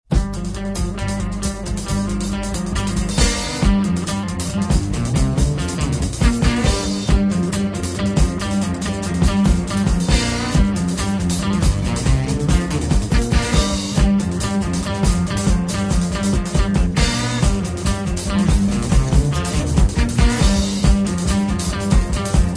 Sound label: guitar intro